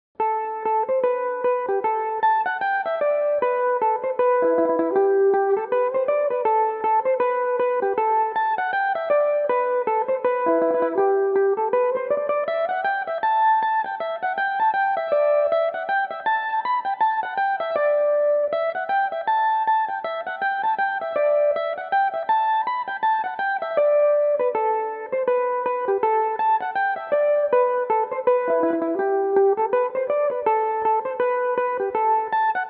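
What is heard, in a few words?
A dorian, mandolin; The Hen And Her Clutch; Belmuse EM-190 electric mandolin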